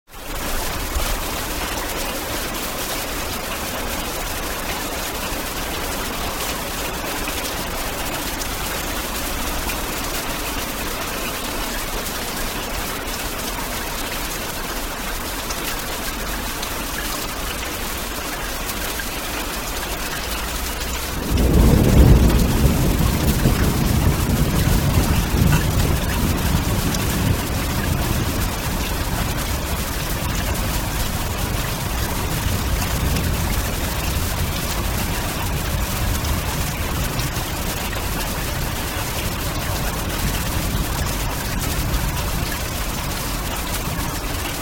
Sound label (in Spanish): lluvia truenos suaves